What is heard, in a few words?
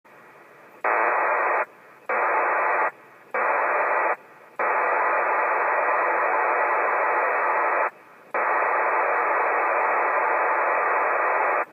PACTOR IV audio